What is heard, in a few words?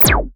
beam